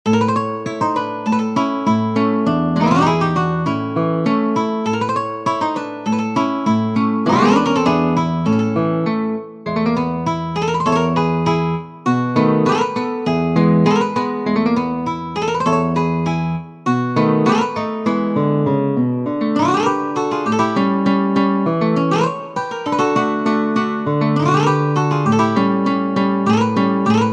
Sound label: Polka, guitar